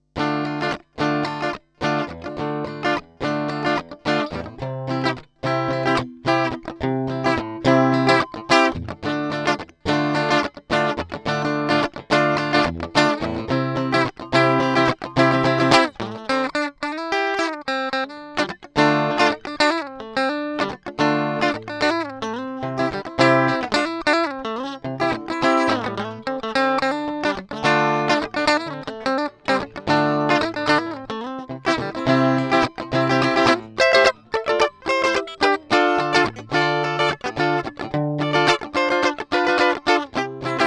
Amp

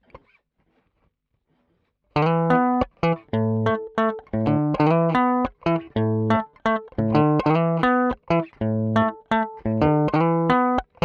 Hi-Fi DI

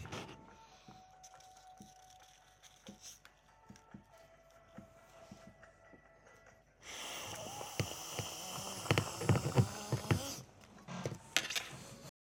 snort; logic

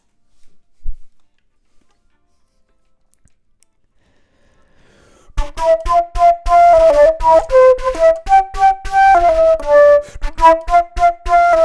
flute

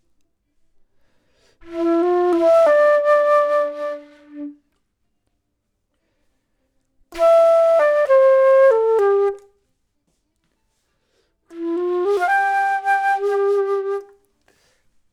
flute

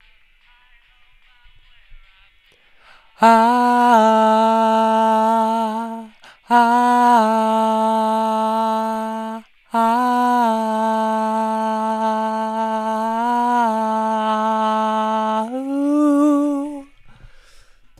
vox

